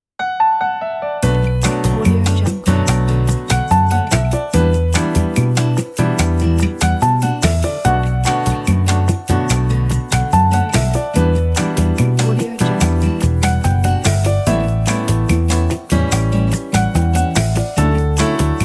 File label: elevator in rio